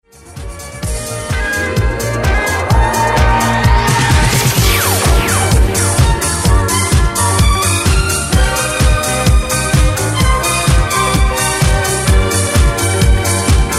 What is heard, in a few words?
Disco Bump